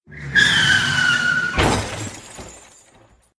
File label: Car Crash